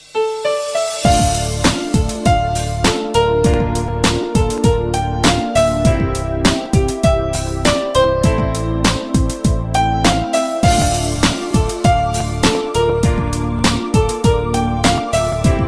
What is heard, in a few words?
Romance Music Ringtone